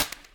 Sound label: fishing line break